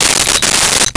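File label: Ratchet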